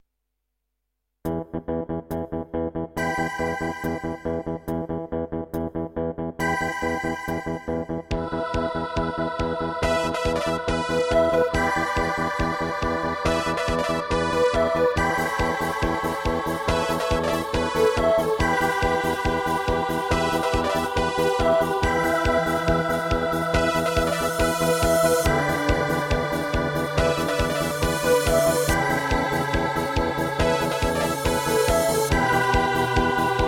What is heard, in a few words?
Jamm, Instrumental